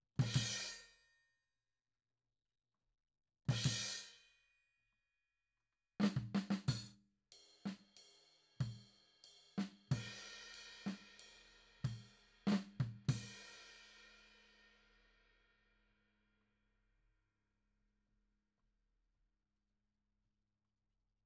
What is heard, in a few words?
Tom